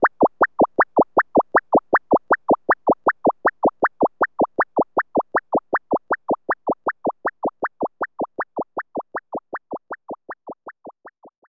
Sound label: MAP MOVE WALK; maimai